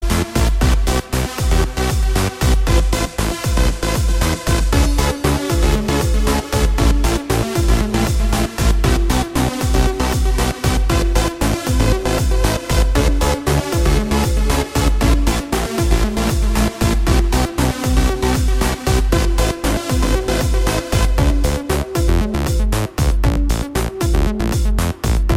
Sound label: Trance Beat